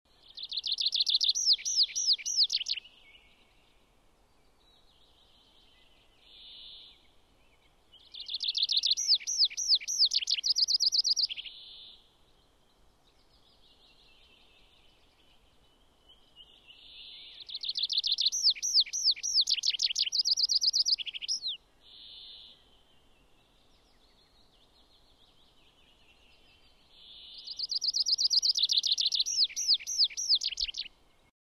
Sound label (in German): Vogelstimmenspiel Baumpieper